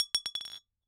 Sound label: Bottle Struck